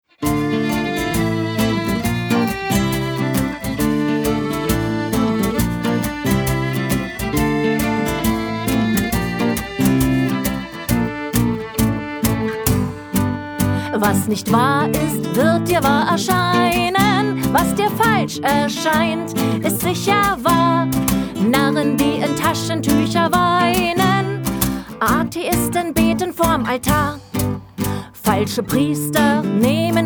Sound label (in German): Das Lachen